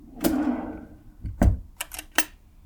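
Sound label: hotel drawer close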